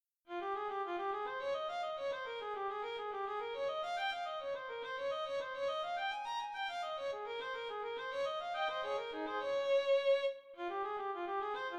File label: Violin Stream